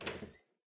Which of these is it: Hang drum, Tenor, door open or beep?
door open